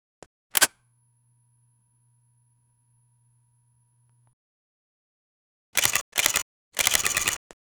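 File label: fast digital camera shutter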